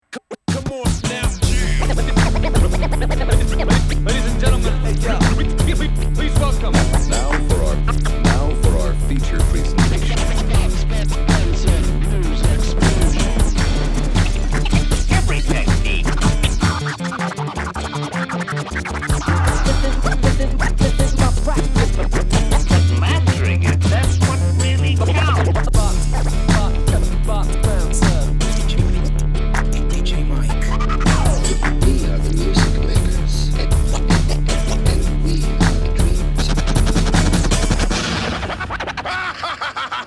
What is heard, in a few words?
Scratch